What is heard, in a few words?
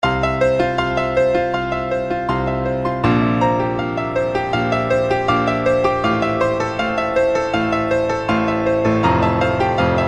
nature background music tone ringtone